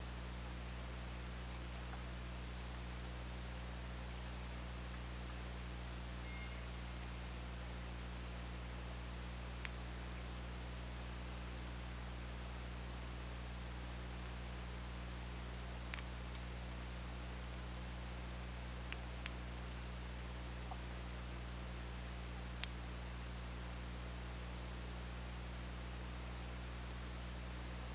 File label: OrcaLab